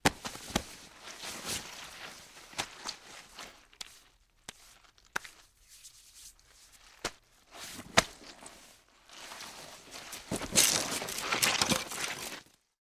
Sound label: Body Falls Getup Mud